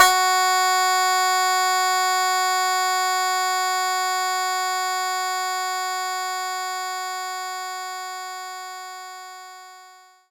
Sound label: Banjo F4; knights try